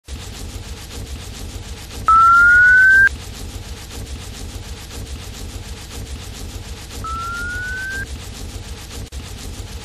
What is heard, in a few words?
sonar